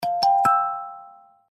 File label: verifone remove card